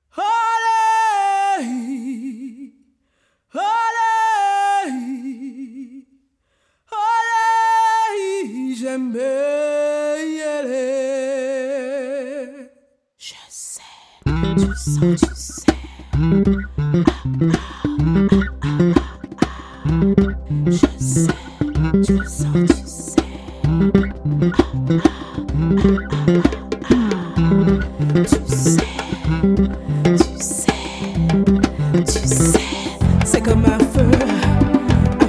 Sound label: The Djembe Song